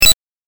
8bit 選択

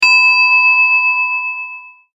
りん 短い

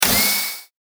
小型ロボットの駆動音

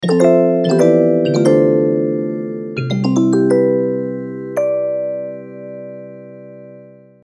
ミステリー オルゴール

